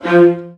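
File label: VIOLAS G3, Strings; ILIO; Synclavier Strings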